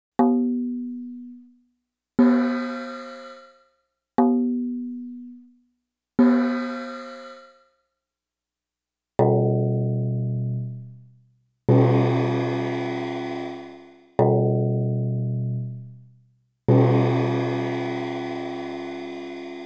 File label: gongs